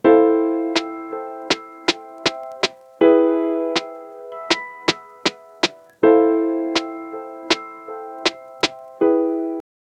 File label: warm lo fi hip hop beat